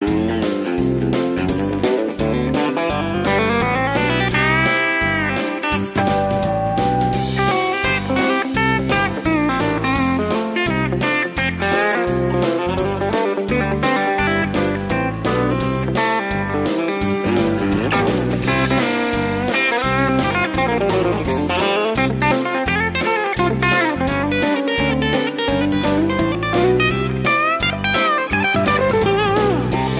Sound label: country jam